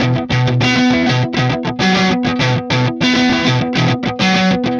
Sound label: Electric Guitar, Loops; Guitars